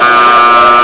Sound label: BUZZER